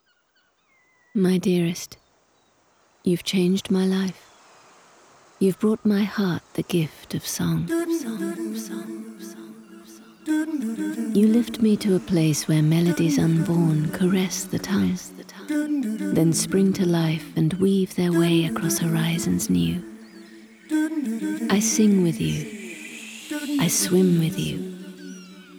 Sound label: Waves